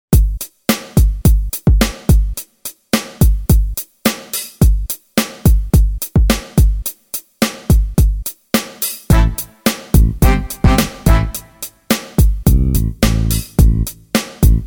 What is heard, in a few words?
70's Funk; Soundzabound Volume 3 Disc 1